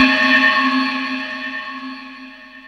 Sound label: JD800 Internal Drums, Roland JD800